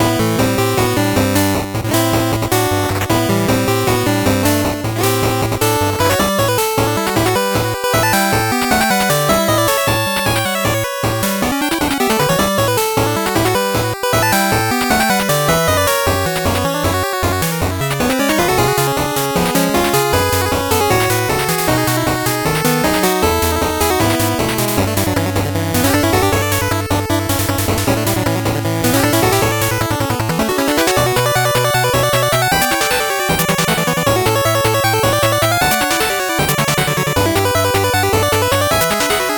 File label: 8bit bgm slow